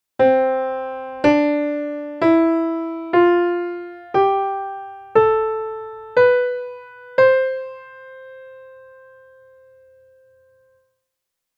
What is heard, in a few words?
Major Scale Lydian Scale